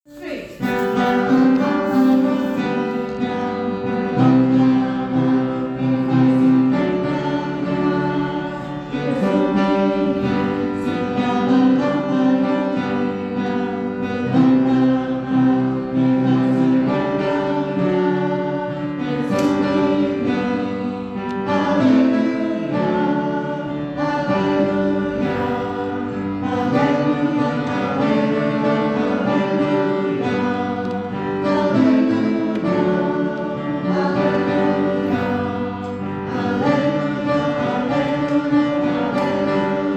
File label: Tenor